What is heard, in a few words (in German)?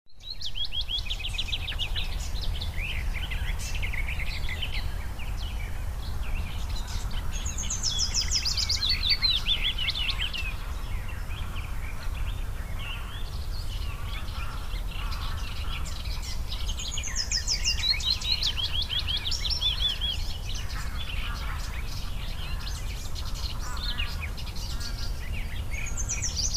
hohenfelder see am wochenende; zwischen 600 und 800 uhr; koeln; angort koeln